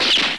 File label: Ion beam; Starbound II